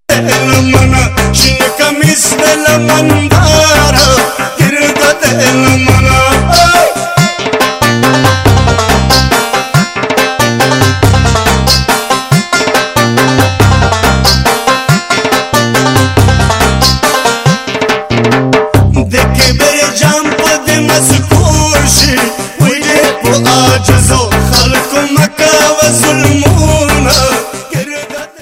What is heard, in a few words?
bass ringtone; pathan